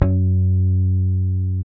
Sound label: E-Basses, Roland